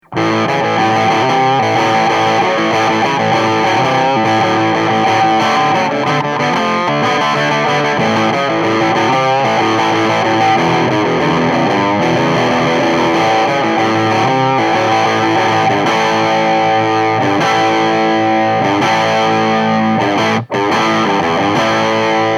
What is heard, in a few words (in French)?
Canal 2 à fond, SH4 bridge; White Jack, un 3 canaux; AMPLI